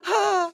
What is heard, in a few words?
AHurt; Morty